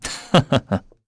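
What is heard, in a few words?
Laugh, Korean; Lusikiel; kingsraid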